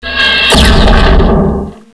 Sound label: ship metal chunk flies; ambience